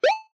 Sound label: menu open